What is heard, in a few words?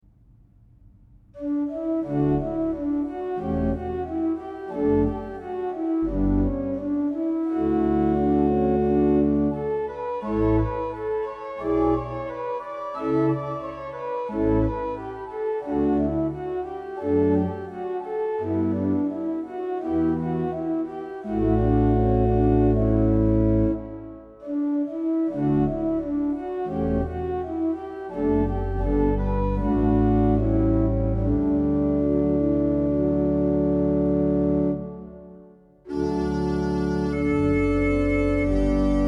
Ped Bd16 Pr8 Hw Pr8 Rw Fld8 Dulciaan8 termul; Midwolda; KB Ps 84